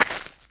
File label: gravel, ambient; Shadow Man